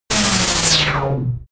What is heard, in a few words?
power charge